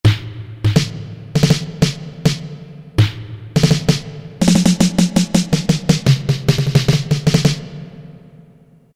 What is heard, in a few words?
Deep Snare; Roland MT-32